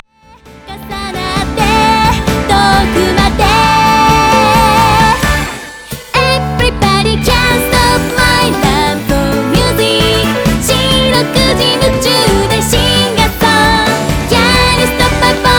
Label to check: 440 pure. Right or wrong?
Right